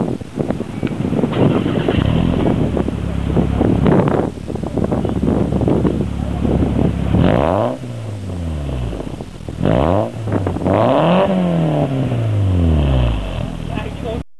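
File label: fireball 3SFE, muffler, TOYOTA CURREN; 5ZIGEN Fire Ball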